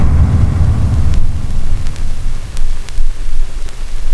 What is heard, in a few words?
line noise, Vinyl